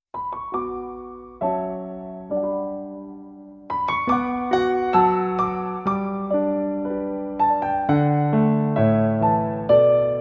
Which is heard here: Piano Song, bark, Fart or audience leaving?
Piano Song